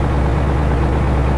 PickUp Idle; 暴力赛车

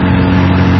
V8 Sample; 暴力赛车

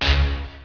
Button; 特酷声音素材库; 按钮

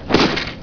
Fight; 特酷声音素材库

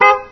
Honk; 暴力赛车

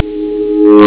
shield Hit; flash MX 2004 Game Programming

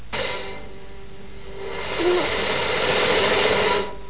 Joy, happymusic; 特酷声音素材库; 背景音乐

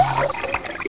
Water, 水声; 特酷声音素材库

